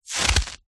foley footstep single boot wet slushy snow; zapsplat

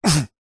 human male short grunt through nose; zapsplat

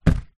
kitchen towel roll place down; foley

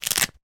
fabric wallet purse velcro open

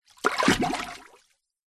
nature water 2ft deep single hand splash deep

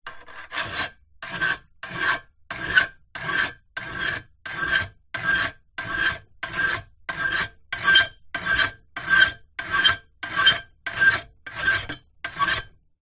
industrial hand file filing metal

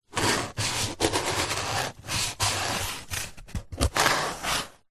foley cardboard box lid flaps rub scrape together

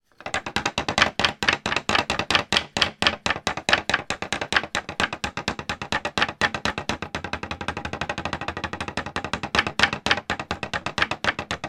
foley wood rattle bang shake

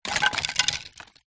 wood small thin pieces drop ground bounce scatter; foley; zapsplat

